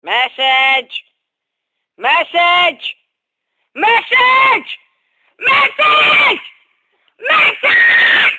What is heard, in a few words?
funny message tone for whatsapp ringtone